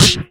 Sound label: Beat Box Snare